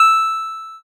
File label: ding